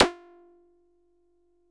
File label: SNAP SNARE, DRUMS; Music Store Sound Service; Einstein